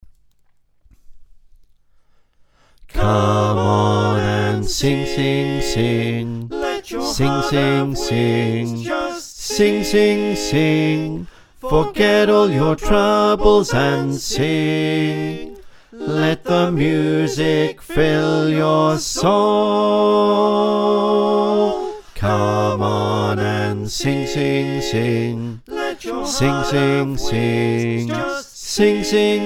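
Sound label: bass, choir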